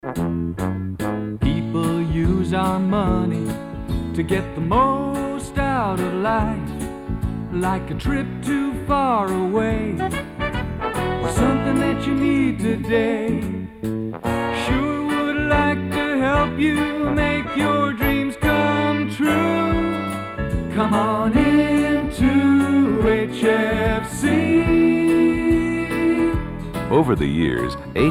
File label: Music